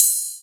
Rides, Cymbals

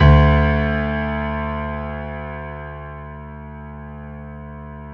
PIANO D2, STEINWAY; Greytsounds - Philip Wolfe David Crozier's Saxophones; GR8 STEINWAY

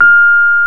PNO, Rhodes Mk.V; Keyboards of The 60's and 70's

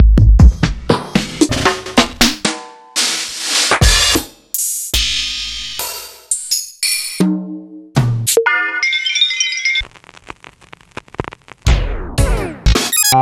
Tribal House; proteusvx

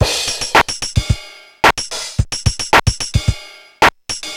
Korg MR-16 (110BPM), beats

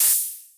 Hatty, Yamaha FS1R

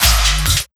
808 Bass, Fx

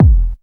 bass drum deep, techno trance drums

